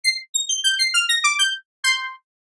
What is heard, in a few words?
rev glass; reverse